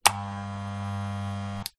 household hair clippers on run no cutting short off